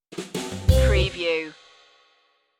rag sting

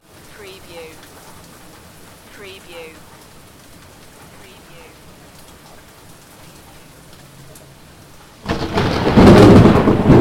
Manchester heavy rain thunder and lightening; beanstalk audio

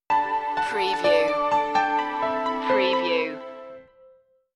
spin me around stinger

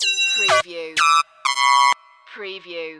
Short n Evil

